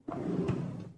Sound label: drawer, checkers